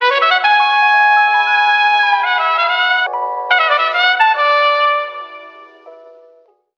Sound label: horns, Loops, Phonky